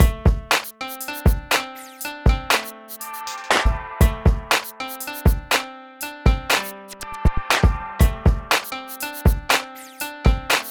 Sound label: Koto And Shamisen Loop